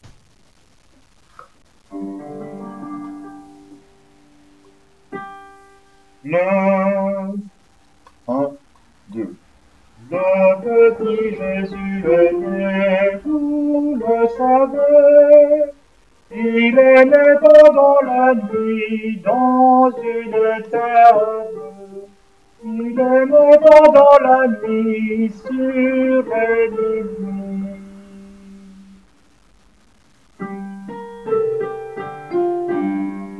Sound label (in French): Sopranos melodie; Le Petit Jesus